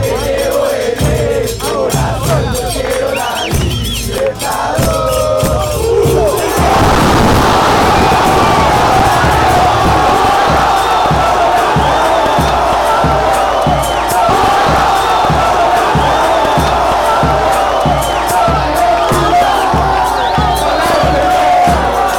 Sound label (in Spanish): gol, chants